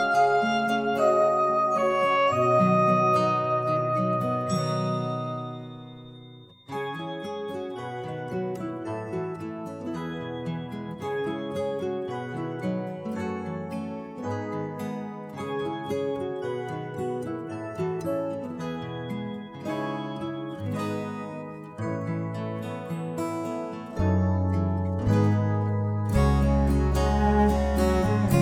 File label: callback instrumental